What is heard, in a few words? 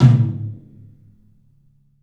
TOM, 3D Drums, CLUB TOM; 3D Sound; CLUB 12 TOM